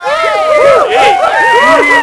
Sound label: solo; WhiteOut; XBOX; trojan